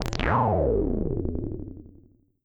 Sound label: slime monster